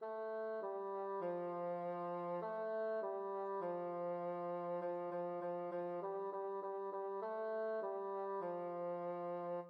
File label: bassoon